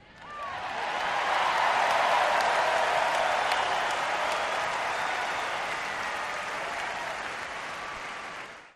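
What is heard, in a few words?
Crowd 50 ooo W Whistles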